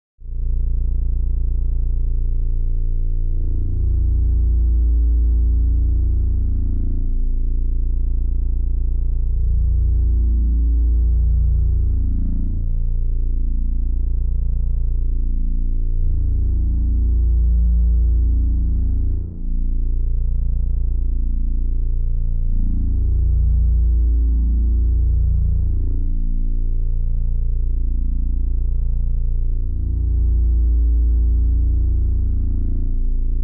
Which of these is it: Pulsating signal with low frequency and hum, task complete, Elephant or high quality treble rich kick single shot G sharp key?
Pulsating signal with low frequency and hum